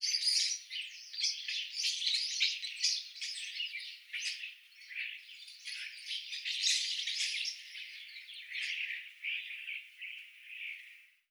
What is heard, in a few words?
few starlings birds